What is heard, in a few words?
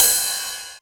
909 Ride; Novation Drumstation V1